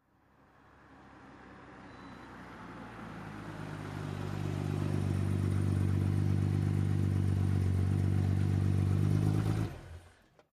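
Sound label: Bmw 530i, In Slow, Stop close up, Idle, Off.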